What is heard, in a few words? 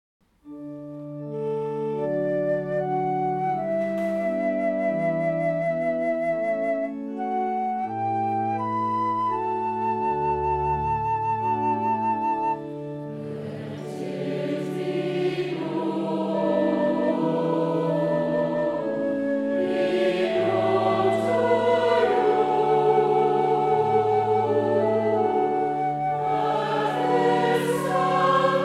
Let it be known choir